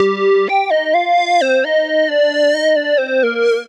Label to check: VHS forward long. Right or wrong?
Wrong